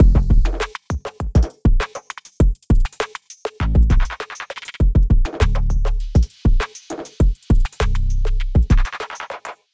Lofi Beat

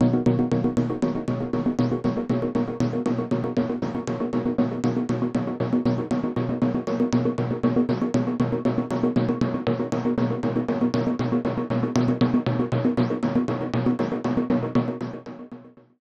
Synth dry

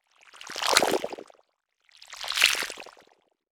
Move Organic; Tsugi

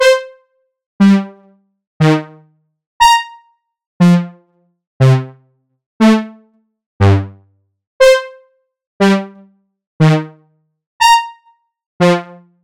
Synth Brass wet

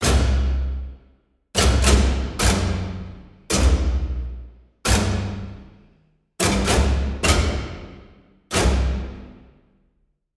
Bartok Clusters

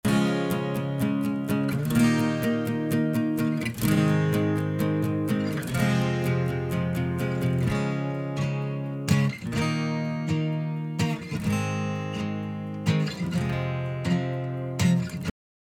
acoustic dry

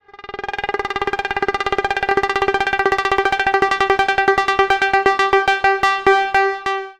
Detune; synthesizer; SOMA Laboratory; FLUX

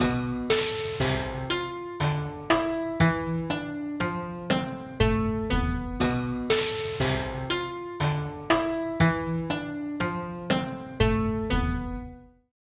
Dry, altered piano; THE GIANT (altered piano Kontakt library from Native Instruments); Newfangled Audio

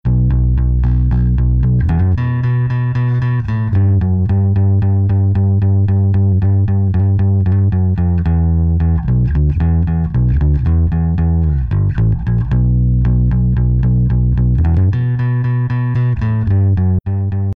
bass more effect

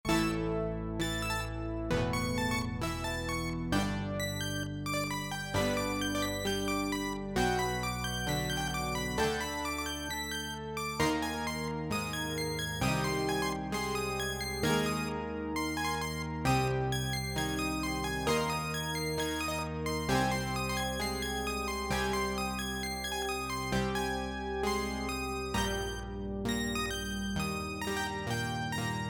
Really Ambient; generative music; PinkAI; 4 Chamber Sound; Max for Live

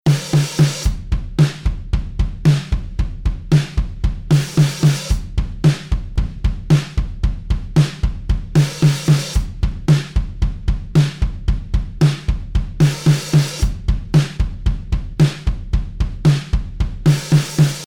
drums with effect

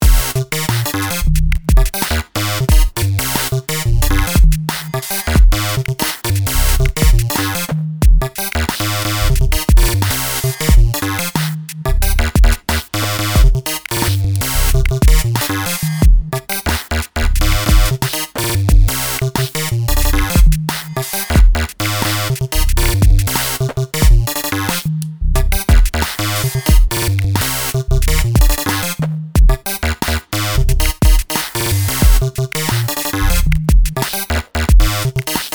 repeat drums, sequencer; Numerology; Five12

